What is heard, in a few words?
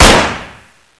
mk22 shoot; svencoop